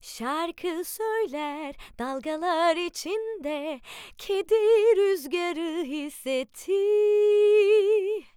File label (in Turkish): Şarkı